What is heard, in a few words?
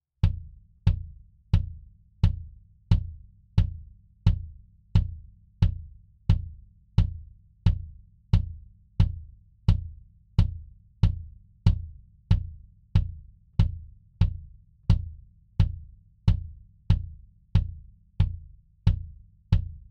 kick test